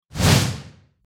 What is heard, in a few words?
swoosh transition